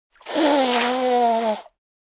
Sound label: Nose Blowing